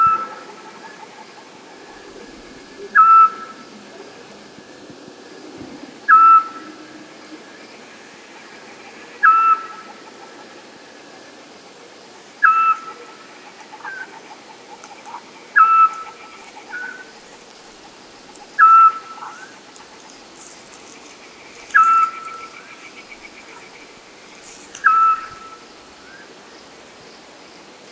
syczek

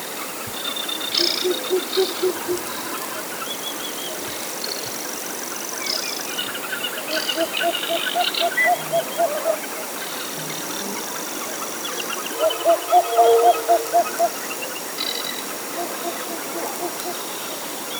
uszatka blotna Asio flammeus

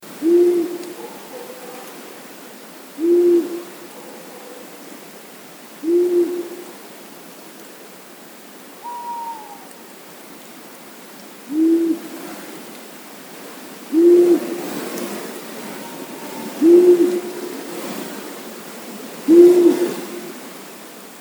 male i puszczyk

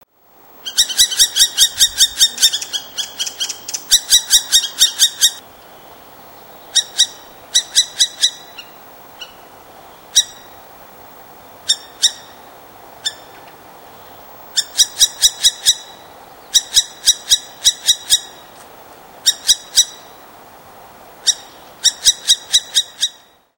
sowa jarzebata Surnia ulula

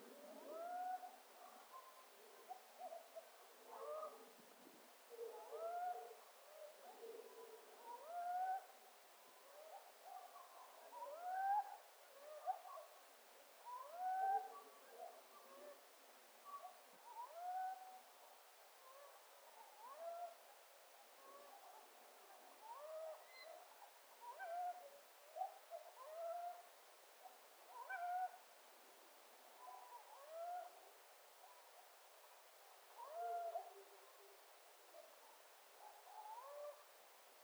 duet, sowy

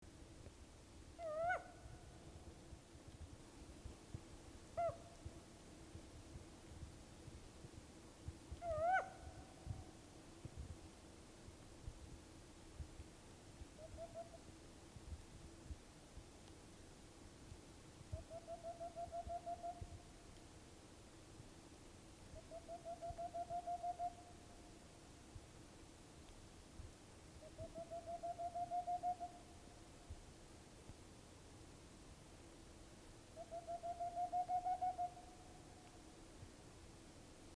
warning teritorial, sowy

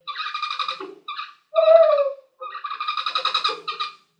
Uszatka glosy socjalne

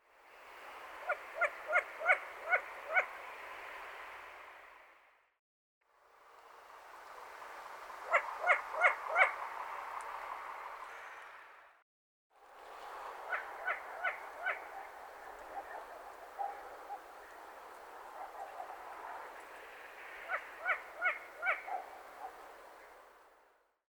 uszatka zwyczajna Asio otus

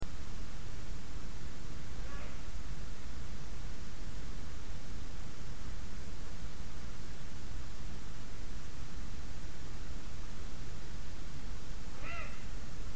puchacz zwyczajny Bubo bubo

